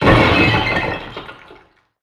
shatter

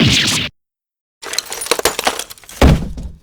damage; scuttlebutt

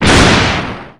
damage

